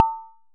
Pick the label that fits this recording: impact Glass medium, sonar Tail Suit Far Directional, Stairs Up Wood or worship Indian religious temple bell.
impact Glass medium